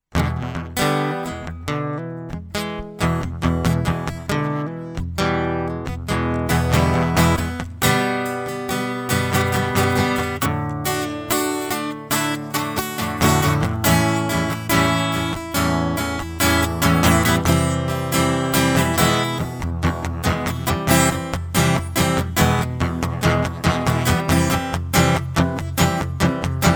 acoustic

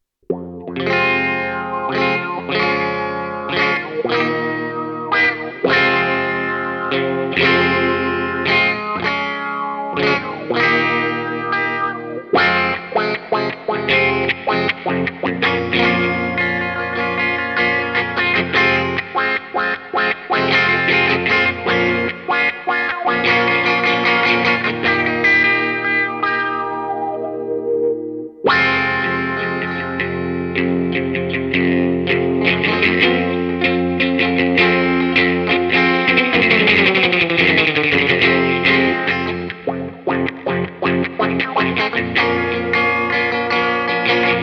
electric

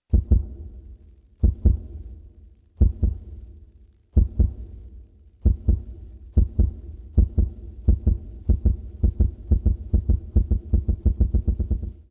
heartbeat; MegaEpix

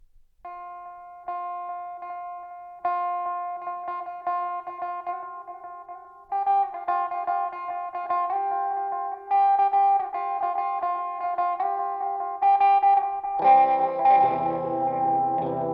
electric; MegaEpix

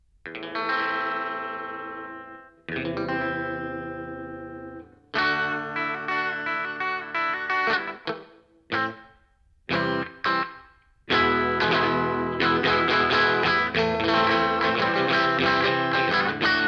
House of the Rising Sun electric

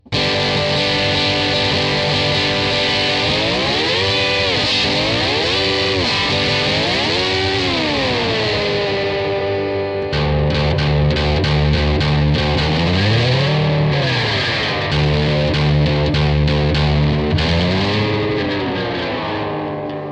electric; MegaEpix

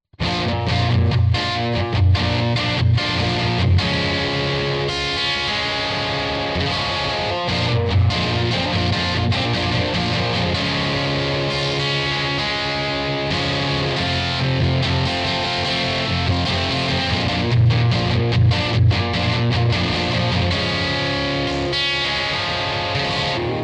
electric

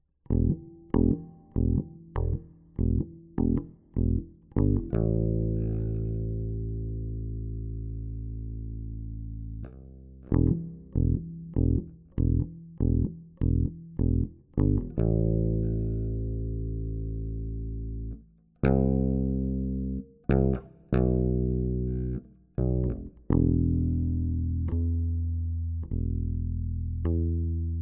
bass riff

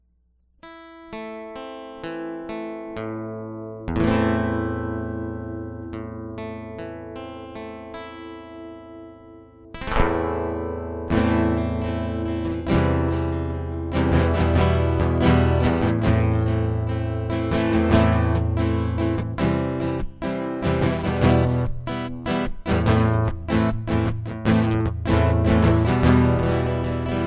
acoustic; MegaEpix

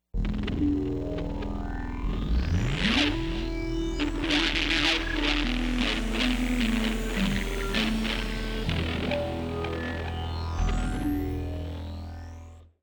synthesizers

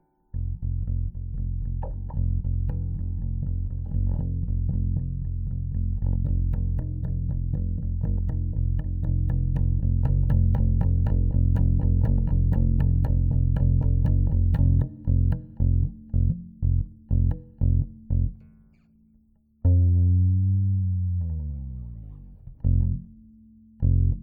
bass intro

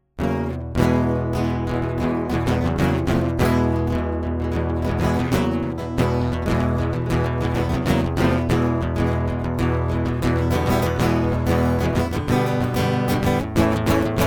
acoustic

